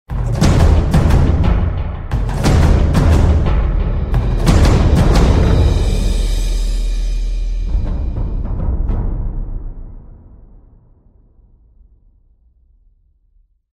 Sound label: game ringtone; bbc